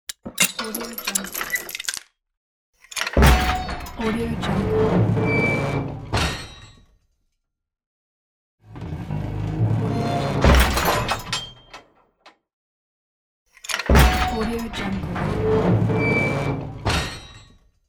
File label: grating metallic door